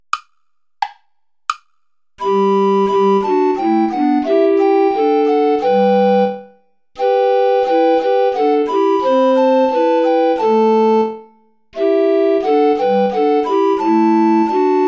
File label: play along without alto recorder; goudimel claude; sus serviteurs seigneur